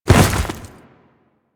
Step Ice, Footsteps; Warframe; Grineer

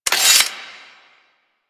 Spear Gun Equip; Weapons; Warframe; Tenno; Hoplite

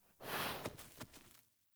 Wolf Steps Jog Start; Animals; Foley Actions

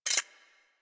Pistol Mode Switch Exit, weapons; Warframe; Thanotech

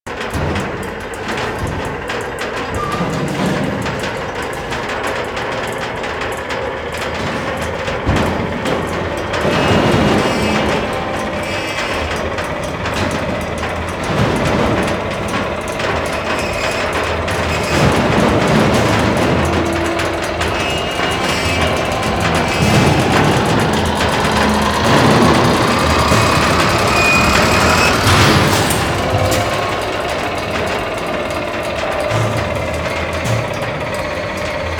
Eye Needle Loop; Ambience

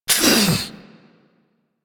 Injured Grunt

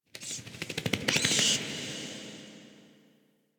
Interior Cave Bat; Warframe; Ambience; Duviri

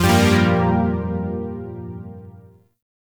Shawzin Blues Chord; Zariman Shawzin

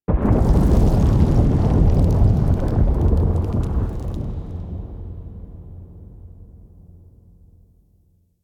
Earthquake Event; Ambience; Warframe; Orokin Moon

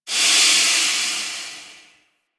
Landscape Exhaust Bursts; ambience; props; one offs; Warframe; Venus Open World

